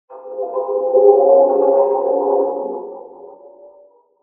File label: in Horror, Vocoder